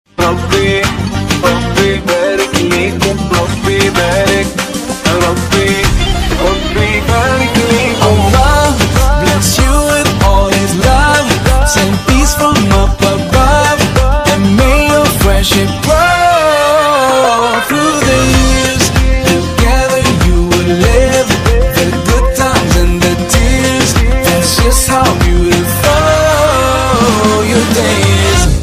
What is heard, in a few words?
eng arabic ringtone